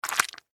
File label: Horror Squish; Monster Sound Effects; Monsters (Sound Effects:Horror)